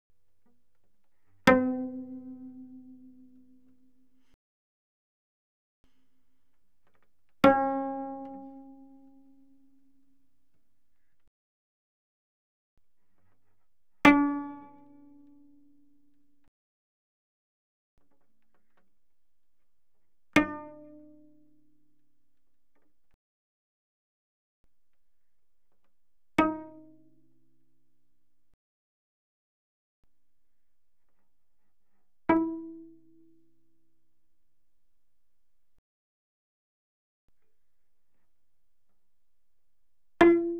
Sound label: Cello pizz ff sul D C4 Ab4; Strings